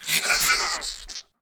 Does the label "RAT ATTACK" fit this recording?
Yes